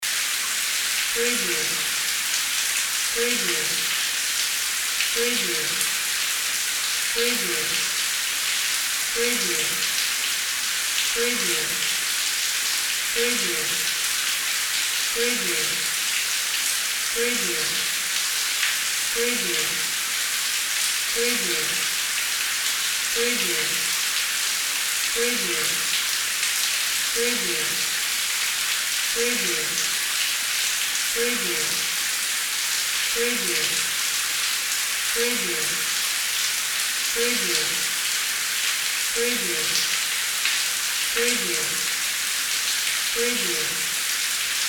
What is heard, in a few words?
heavy rain